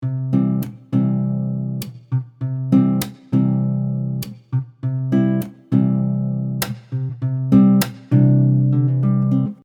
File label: Percussive Cmaj 100bpm, Soft Nylon Guitar; Percussive Patterns; MG Soft Nylon Guitar - MIDI Pack